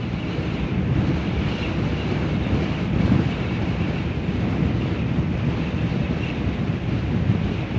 wind gusty, ambient

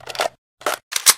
ak74 reload, weapons

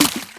impact wpn small water

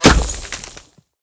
dirt, bullet collide

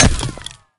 sand, bullet collide

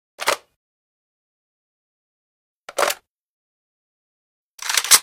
abakan bore, weapons

